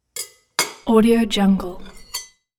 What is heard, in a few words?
knife on plate